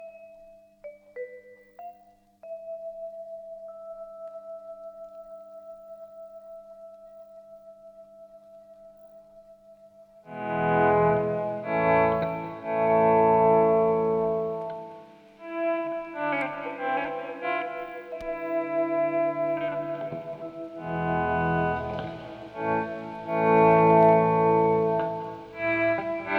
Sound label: Instrumental